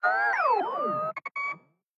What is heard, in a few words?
flying robot; factorio; Factorio 0.14.21